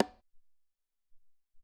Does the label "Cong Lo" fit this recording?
Yes